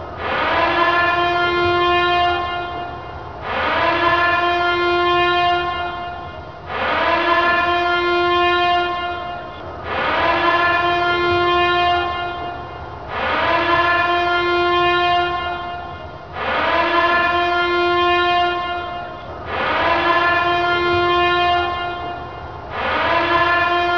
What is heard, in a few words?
siren